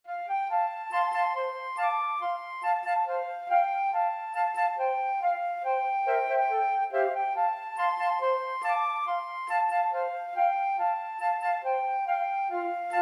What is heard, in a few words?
flute quartet